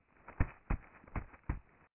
deep footsteps; Five Nights at Freddy's; FNAF 4

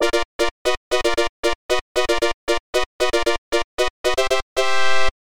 Gate Open; Fail Gate; arcade

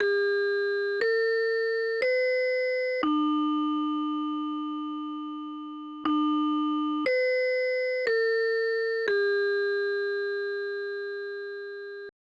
School Bells; Paladin's Quest; Lennus; SNES

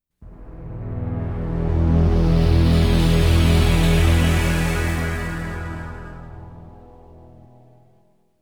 GAME OVER, Game Over BGM; GTI Club; Jet Wave; Winding Heat

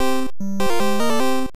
Player Miss; Ochin ni Toshi Puzzle Tonjan; Family Computer

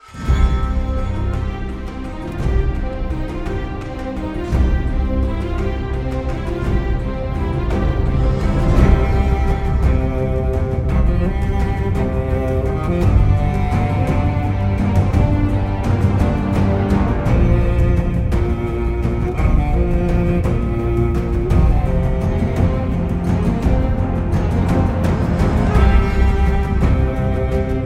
Opening Credits Theme Music

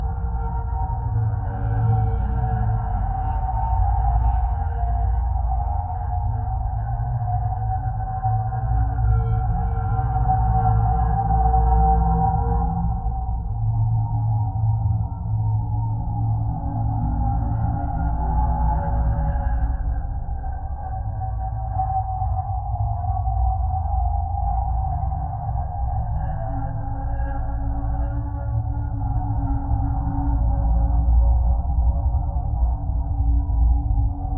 Ambience; Toy Freddy; Five Nights at Freddys; FNAF Special Delivery; android ios

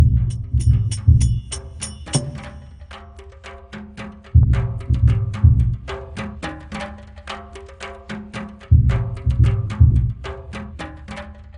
Jungle Level; sim theme park theme park world 1999 pc gamerip

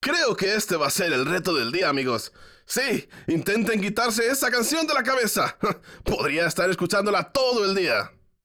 Music Vehicle Radio Events

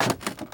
Foot Squeaky Wood Bridge Walk

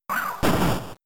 Stomp; Pokemon; Gen 3; attack moves; RSE

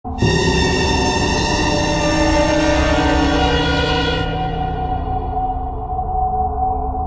SHOCK; F.E.A.R. Perseus Mandate

